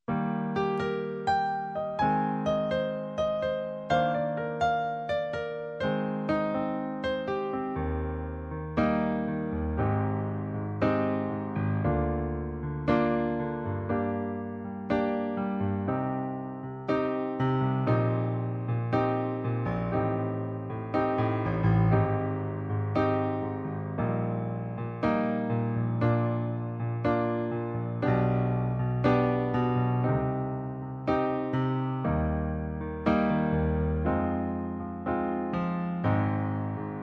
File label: chords